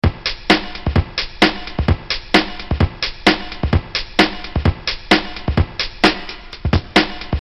Loop

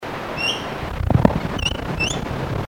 Bronzed Drongo; Dicrurus aeneus; call; Bird Call Recordings

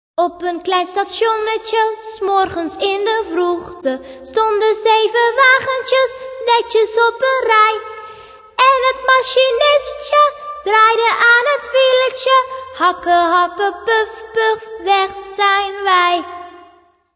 station; Op een klein stationnetje

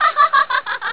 old laugh; Street Fighter; Chun-Li

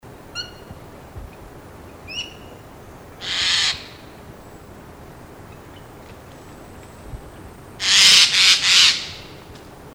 Eurasian Jay, birdcall; Garrulus glandarius; Bird Call Recordings

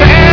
fly

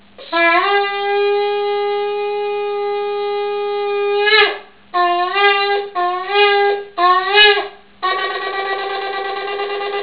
shofar

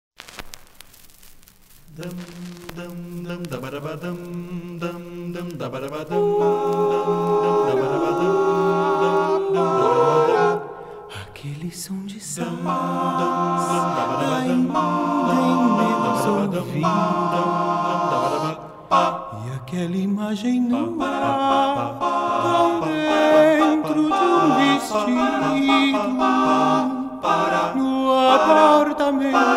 sax blue

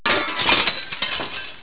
shards

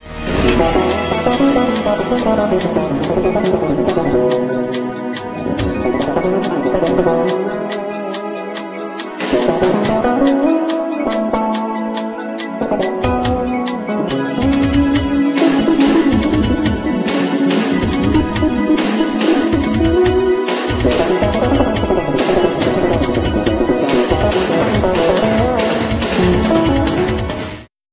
bass solo

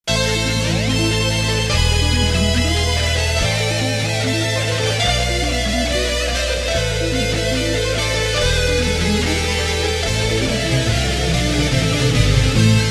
fugue in a minor

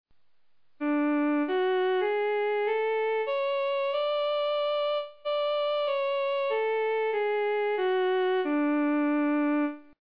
amRuthav, carnatic

